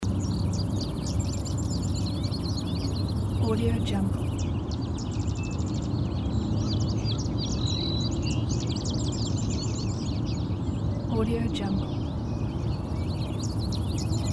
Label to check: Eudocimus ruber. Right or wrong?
Wrong